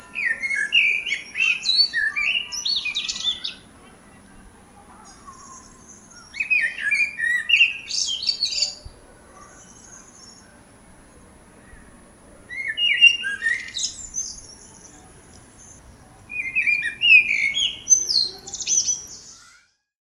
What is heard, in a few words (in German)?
Amsel Turdus merula, vogelstimmen